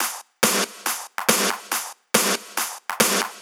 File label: Loop 140 BPM; vengeance essential club sounds-3